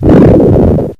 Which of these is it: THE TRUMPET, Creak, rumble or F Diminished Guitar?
rumble